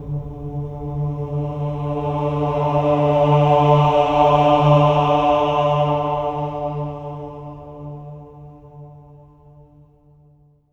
AH D3, swell ahs, Symphony of Voices; Spectrasonics

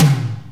ROOM TOM; AKAI S6000 CD-ROM - Volume 4; Snare 2

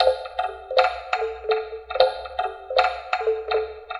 Mbira Kulfi, Acoustic Beats; Sonic Foundry (Sony Creative Software); Myths of Technology

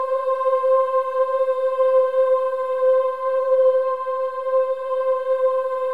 Boys; Spectrasonics; Symphony of Voices

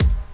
KIT, Dance Mega Drums; Best Service

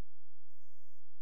A#, FX; Big Fish Audio

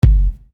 Bass Dr, EuroDance Bdrums

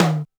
Mid Tom, TR626

